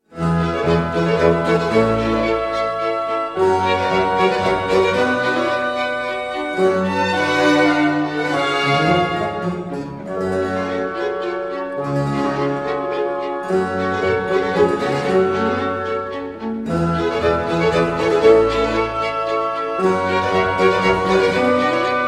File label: overture for 3 oboes bassoon 2 violins viola and basso continuo; Georg Philipp Telemann; TWV 55